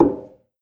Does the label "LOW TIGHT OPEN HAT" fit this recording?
No